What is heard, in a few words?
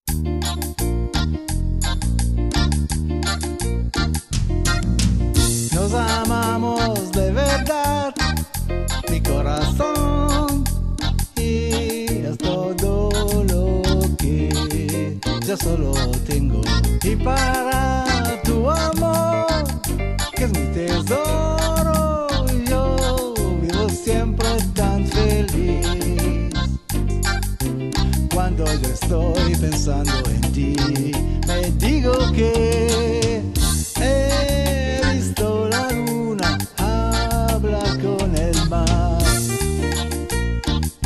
cumbia